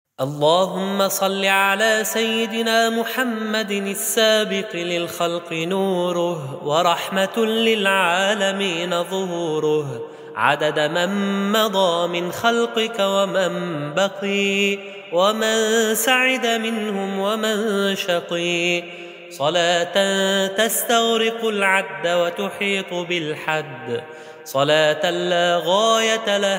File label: Minor Salat